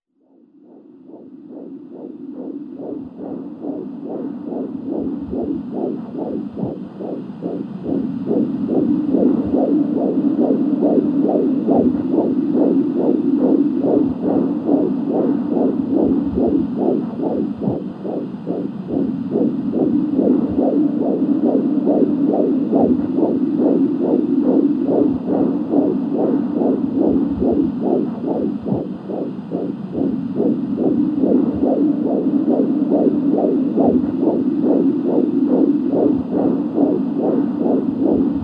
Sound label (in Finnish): sydan